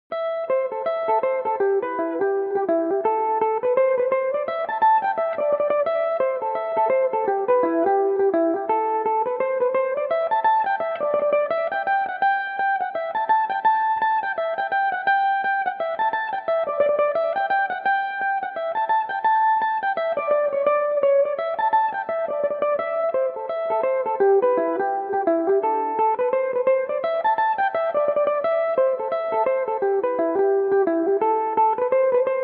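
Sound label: reel A dorian, irish mandolin, tunes; apples in winter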